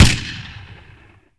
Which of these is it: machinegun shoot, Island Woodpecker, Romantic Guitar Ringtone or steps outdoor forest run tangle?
machinegun shoot